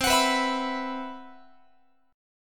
C Major Sharp 11th, Guitar; CM#11 strummed

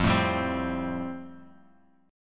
F Major Add 9th, Guitar Open G

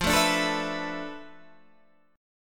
D Minor 6th Add 9th F, guitar chord

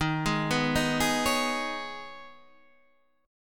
D sharp 7th Suspended 4th Sharp 5th

